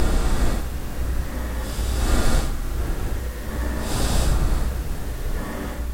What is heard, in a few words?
lich beats